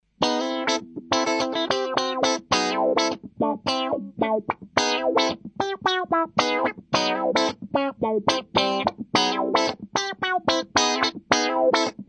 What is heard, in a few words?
wha, guitar